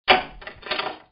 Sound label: unlock